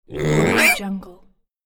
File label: piglet grunt